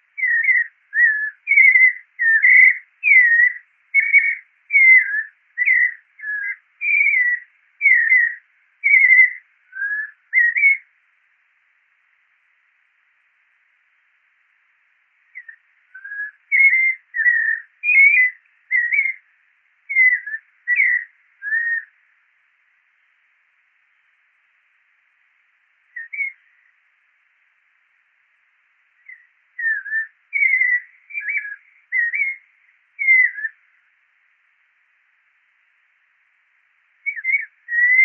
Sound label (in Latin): Turdus albicollis; Zorzal de cuello blanco